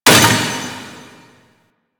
Vaults Stake Gate; Warframe; Entrati

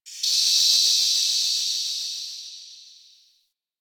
Steam Blast; Warframe; Ambience; Hub; Tenno Junction Relay

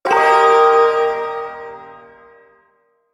Shawzin Pent Min Chord J; Warframe; Duviri Ersatz Shawzin

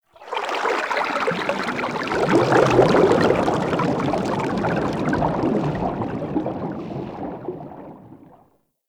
Sharkwing Movement Bubble, Vehicles; Warframe

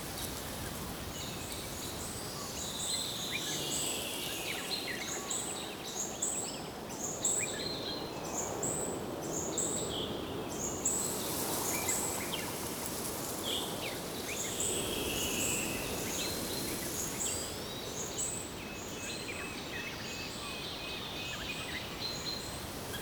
Wetland Forest Day Amb Loop; Ambience

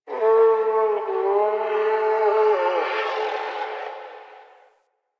Landscape Distant Creatures, Ambience; Warframe; Venus Open World; One Offs

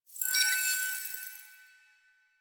Choir Chorus End; Warframe

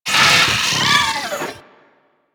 Vocal Death; Warframe; Amalgam Moa

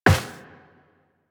Step Metal, Footsteps Walk; DE; Warframe; Tenno

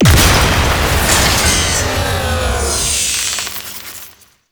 Turret Explosion A; Warframe; Ambience; Grineer Galleon; Vor Galleon Fight

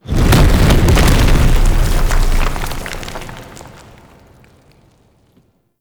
Boulder Crash, traps; Objects; Champion Crypt

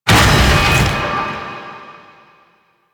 Undertow Impact; Warframe; Warframes; Pirate

